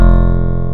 Instrument Digi Piano; Ableton Live 12 Lite; Vector; Sprites

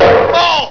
got hit; Digital Paintball 2

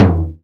Tom 70s MPC; Drums

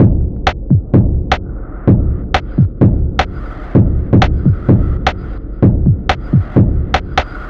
Dubstep Buried 128 bpm, drums; Loops; Ableton Live 12 Lite; Core Library

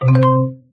menu open; There; ThereClient; theremenu

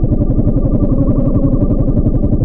hoverbug engine; There; ThereClient

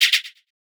Shake Delay; Shaker; Drums

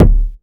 Kick Analog; One Shots; Drums; Skitter

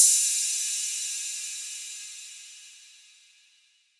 Cymbal 808; Ableton Live 12 Lite; Core Library; Drums

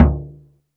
Tom Cheap; Drums

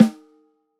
Snare Low Stick Center, drums

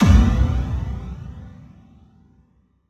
Impact Flanged; One Shots; FX; Ableton Live 12 Lite; Core Library

